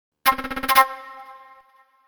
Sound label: Retro 60/70s TV sounding flute trill; Orchestral